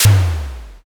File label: pistol